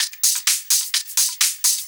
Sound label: Hihat Loop 128 BPM